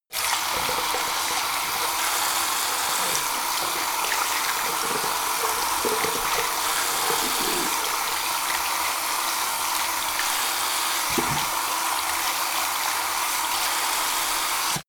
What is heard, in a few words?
sink forced flow wash; haus garten; badezimmer